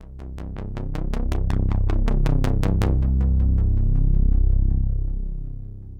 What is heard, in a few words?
SNTH BASS AMBNT 160 C; Ambient